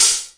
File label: small ohh, hats; DRUMS (SINGLE HITS)